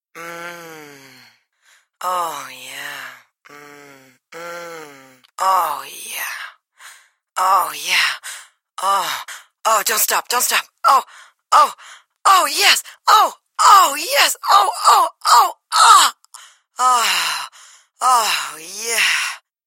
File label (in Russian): zvuki zhenskikh stonov